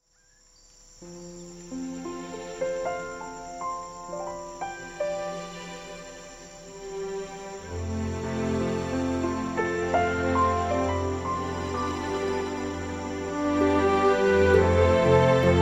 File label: Piano string; colonne sonore